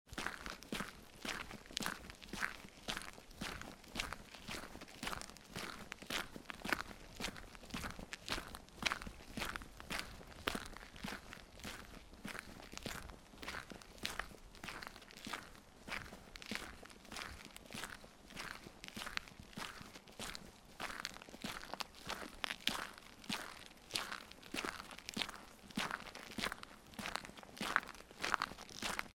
Forest; Forest Track 2

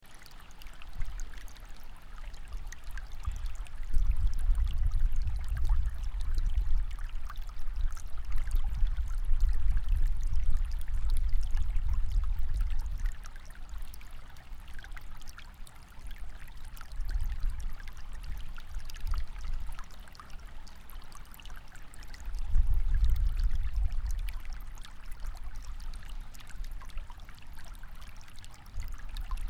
receding tide

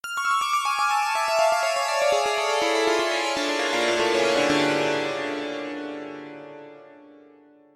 synthpiano synth piano; zasfx

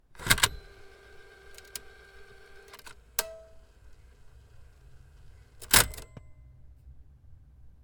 car stereo tapedeck

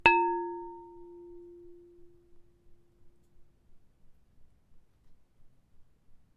chiming pottery

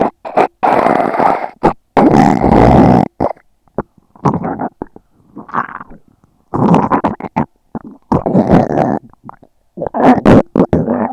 slimy

